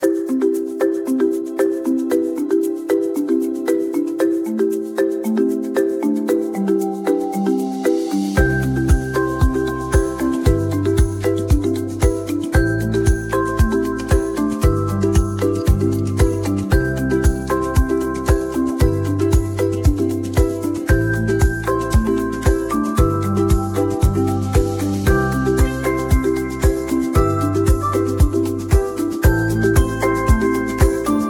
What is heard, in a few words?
theme